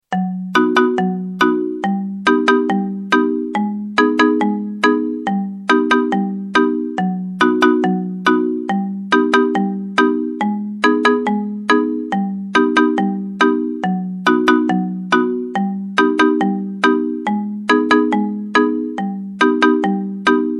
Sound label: marimba ringtone